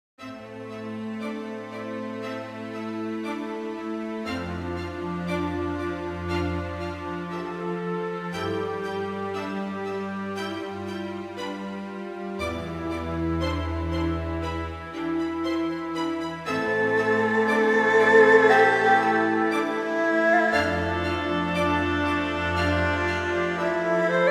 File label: Scene Music; Age of Wushu; Windows